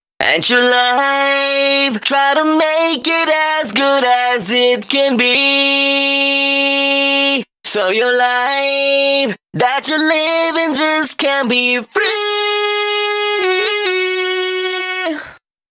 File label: Pitched Vocals Chorus DRY, 128 BPM; Vengeance Samples; VTDS2 Song Kits